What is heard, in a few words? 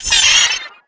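droid spider; Star Wars Knights Of The Old Republic I; XBOX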